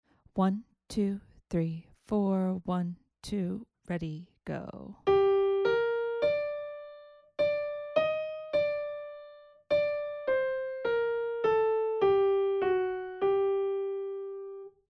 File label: melodic dictation